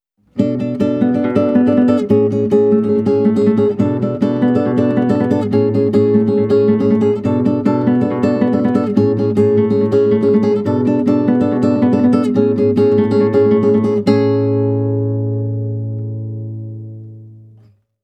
Invincible Background Music, guitar; Super Mario Bros.; super mario series for guitar 2010

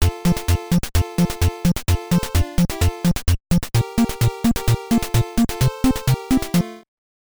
Round Clear [Jingle Bells]; Mappy Land; NES

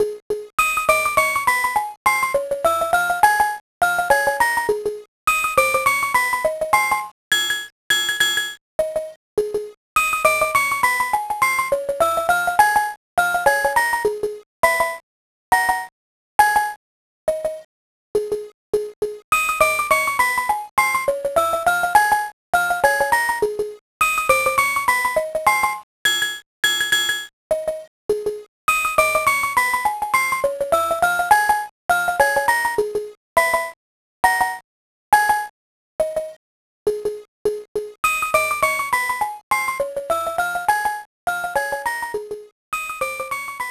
Music Box; Super Mario Advance 4; Super Mario Brothers 3

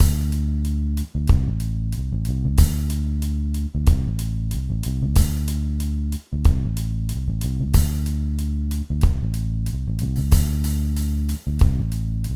Beat; Peggle Beat 01

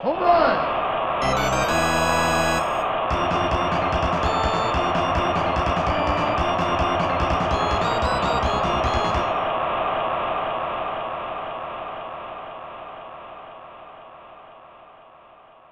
Home Run!; super batter up; snes

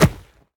body impact; Insects

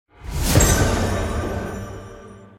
Room Grab; children of morta; Special Room

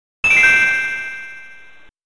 Coin Insertion; arcade; Dragon Ball Z Super Battle 1 2; arcade collection

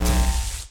Player Attack Red Shock Hit; Nina

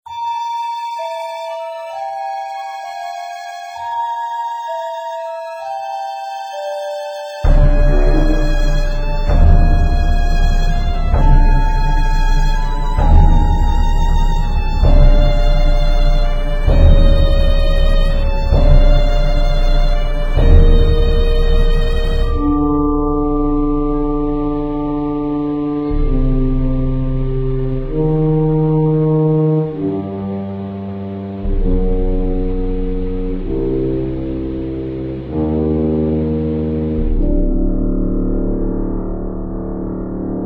Dungeon; Dungeon Journey

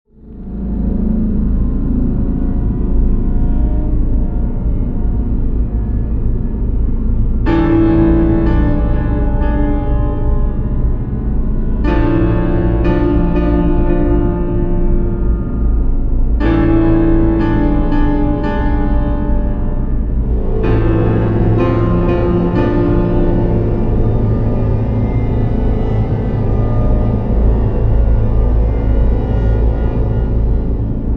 vampire blood lust scary theme

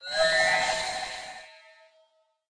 Emission

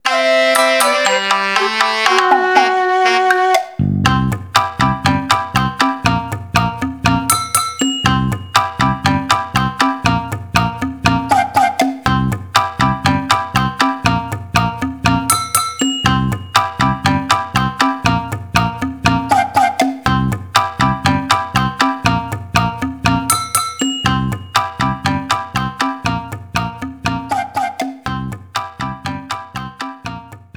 Mini Lose; Naruto Shippuden: Ultimate Ninja 2; PlayStation 2 gamerip

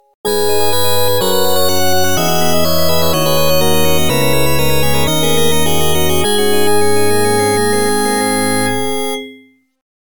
Bonus; magic sword; cps-1

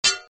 sword sound; gamerip; Arc of Templar

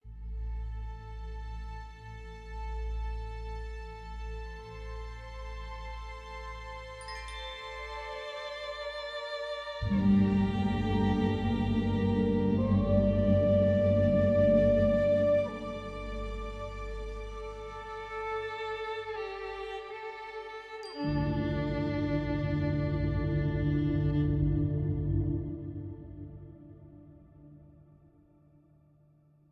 Calm Collected Music Cue; children of morta; Tutorial

